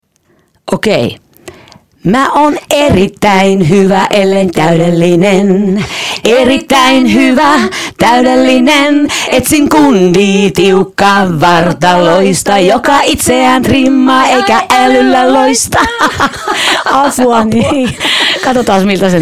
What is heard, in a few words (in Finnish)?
acapellana; Erittäin hyvä